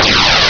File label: Zoom closed; MiniWinDemo 4.0